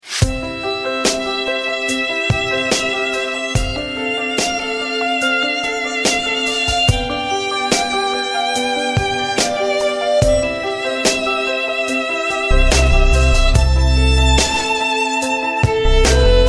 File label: Avemaria; Samsung SM-B690V-Convoy-4